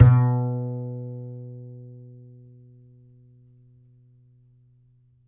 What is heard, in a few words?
G, Upright Acoustic Bass; Larry Seyer; SWITCHABLE POSITIONS